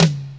hi tom e key, Royality free tome drum sample tuned to the E note. Loudest frequency: 1482Hz; tom drum samples